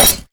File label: scythe break